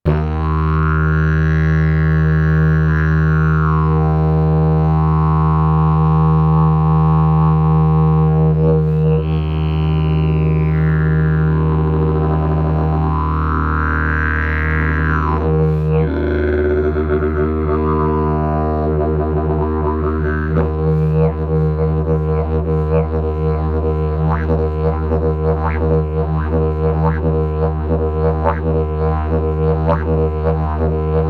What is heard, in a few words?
didgeridoo; javor klinvklinu